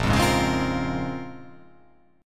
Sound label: A sharp Minor 9th, A sharp, down; strummed